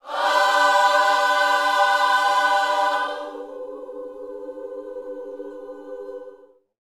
Vocal Gospel PHRASE

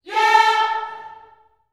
"yeah", gospel vocal, A4; Spectrasonics; Vocal Planet Vol.1 Gospel; akai

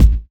BDRUM; E-Lab; X Static Goldmine 2; akai

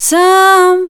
voice spectral, ly short

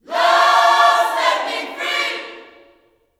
Vocal Gospel MULTI PHRS; LUV FREE; Spectrasonics; Vocal Planet Vol.1 Gospel

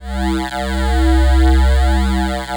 ATMO PADS; Best Service; XX Large - Pads

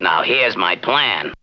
VOX

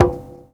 CONGA; E-Lab; X Static Goldmine 2

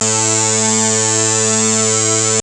TECHNO; E-Lab; X Static Goldmine 1

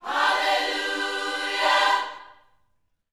YOUTH CH PHRASE, Vocal Planet Gospel

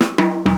DRMROLL; E-Lab; X Static Goldmine 2; akai

